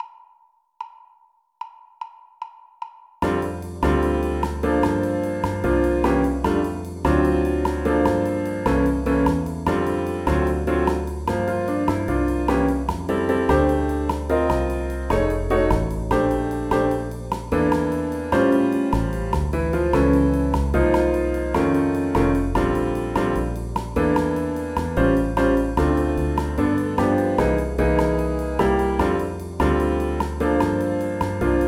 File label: 4 horns, Rhythm Only for Alto Solo; Combo Charts